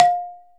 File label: balafon F4; EMU Planet-Earth